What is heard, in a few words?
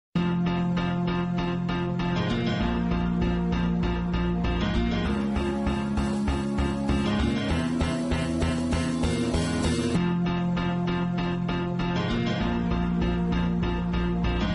blackberry piano; ringtones; sms